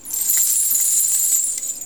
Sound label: belt stones, Belly Dance Belt with Small Stones & Coins